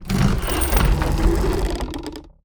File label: alarm ballista